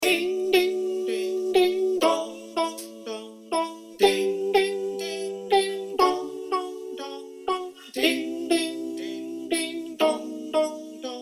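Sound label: Alto, choir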